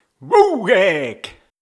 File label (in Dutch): Wooow gek